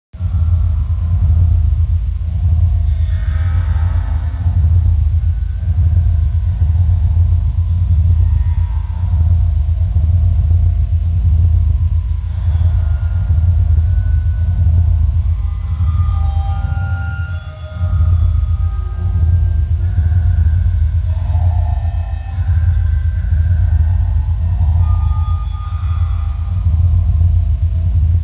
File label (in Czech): Hudba Industrol Bici